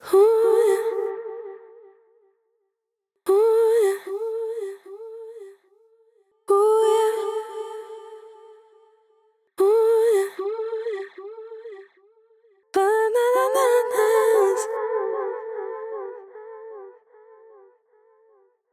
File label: repeater delay; Delay-Plugins; d16